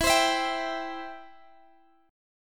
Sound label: B sharp Major, E; B#/E